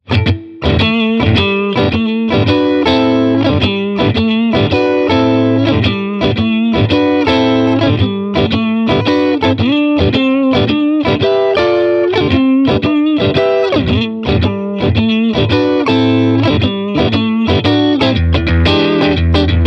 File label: Custom Deluxe Shuffling; AmpliTube; Fender 2; 真空管アンプ